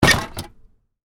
Plastic container full of bottles and cans: short hit; Impact; Miscellaneous Impact Sound Effects; Stuart Duffield